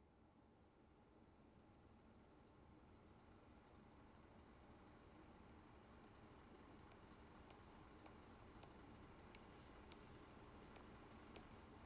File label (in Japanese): 蓄音 包丁づくり体験 包丁づくりASMR